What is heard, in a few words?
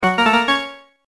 Fanfare Comical Organ; Ranger